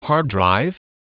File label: hard drive